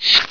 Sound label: snake bite; wanted